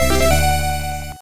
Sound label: Fanfare